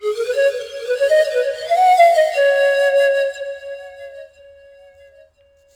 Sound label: FLUTE ETHNO 125